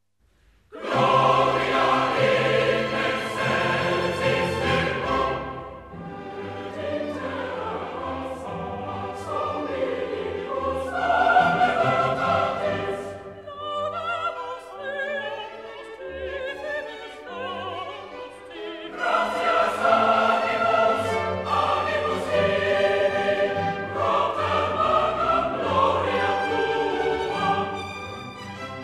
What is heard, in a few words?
Missa brevis in C [Organ Solo] - Gloria; Missae and Requiem; Mozart; Davis, Gardiner